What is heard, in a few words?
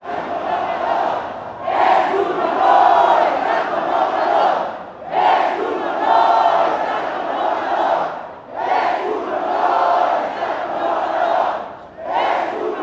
human crowd large mexico city president election; 2012; felix blume